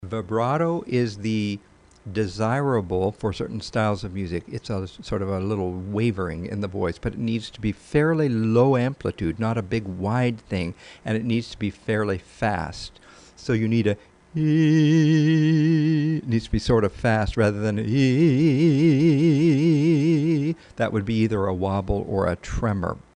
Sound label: Vibrato